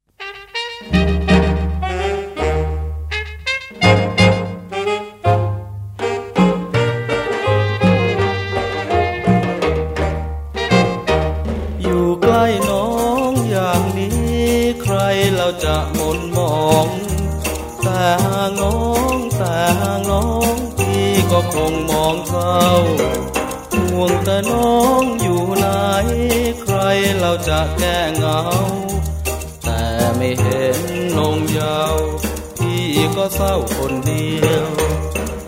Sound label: Guaracha